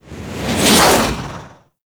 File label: Melee Swipe; Teralyst; Ropalolyst; Abilities; Melee Grab